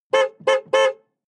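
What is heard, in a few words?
sports car exterior horn multiple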